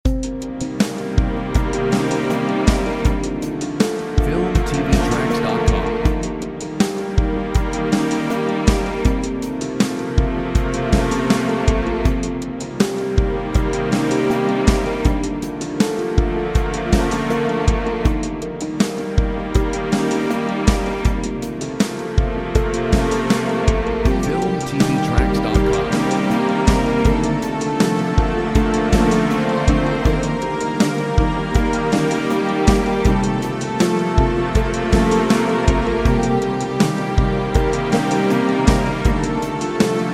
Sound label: Full circle drums